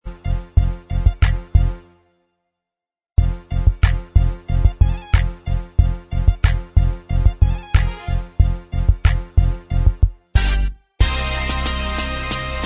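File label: instrumental, track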